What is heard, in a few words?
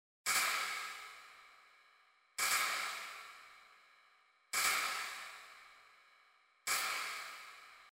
CASTANETS